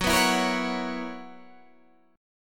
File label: B Major 7th Suspended 2nd Suspended 4th F sharp, chord shape